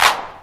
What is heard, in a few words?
Clap